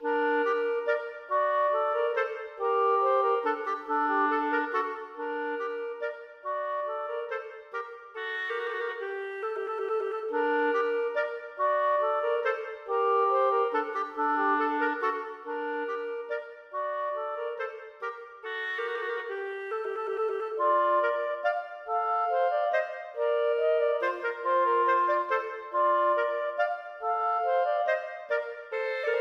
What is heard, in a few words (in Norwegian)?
Menuet for klarinett duett; Aamodt 016c